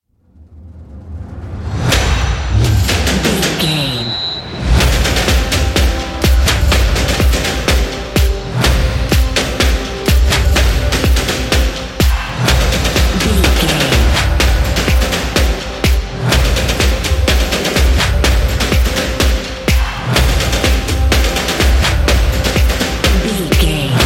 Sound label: football march